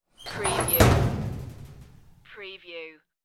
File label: close locker door